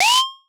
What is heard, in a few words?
Star Instruments Synare PS-1